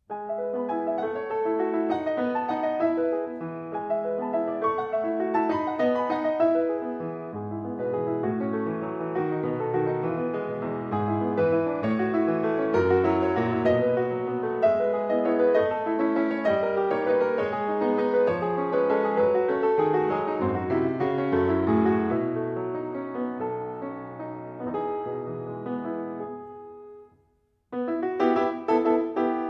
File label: Preludio Piano; Suite Criolla; Modesta Bor